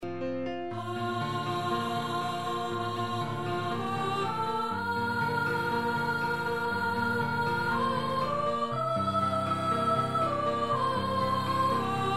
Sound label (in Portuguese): ave maria gounod baixo